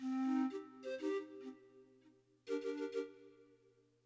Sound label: synth